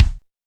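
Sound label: bass drum, drums; Best Service; Real Mega Drums VOL-1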